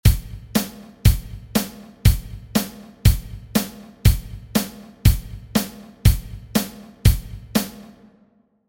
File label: beat